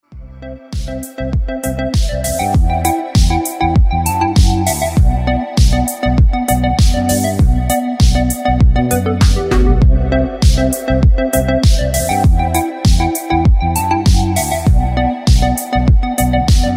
disco balls ringtone, nokia ringtones

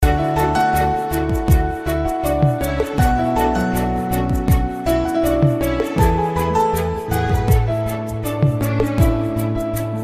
romantic dew drops theme music ringtone

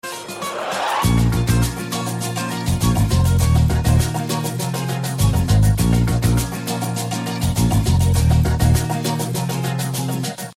sms ringtone

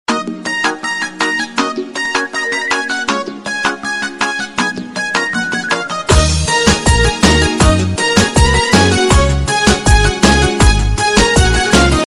arabic ringtone

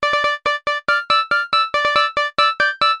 cool sms ringtone

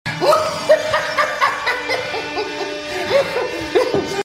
message tone funny ringtone

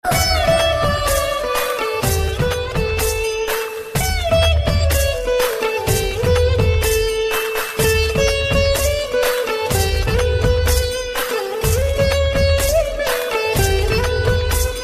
melodious ringtone

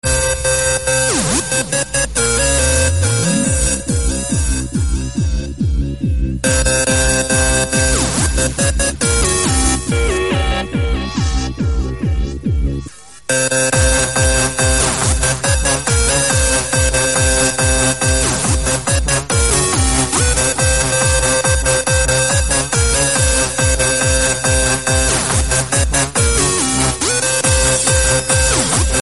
party dance music call tone ringtone

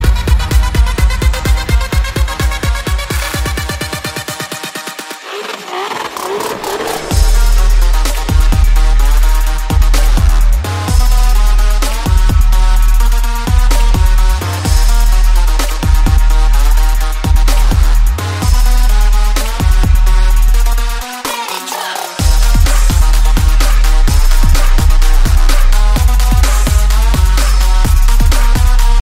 thrilling background music english song ringtone

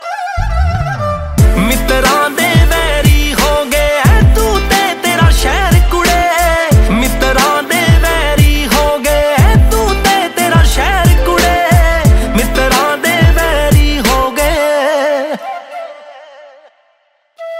new punjabi ringtone

